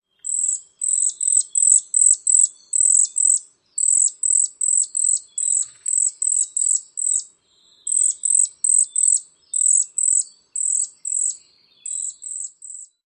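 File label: rapid trilling, Cedar Waxwing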